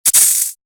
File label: Snake spit venom